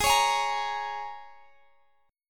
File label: A sharp Minor 7th